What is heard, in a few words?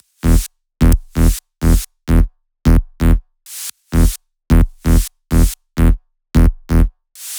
130BPM Melody; Vengeance Samples; VFH1 130BPM Tibet Kit